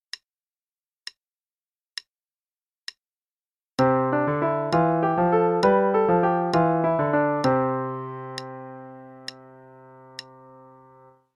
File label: Polyrhythms on C (qn=66); CM Level 6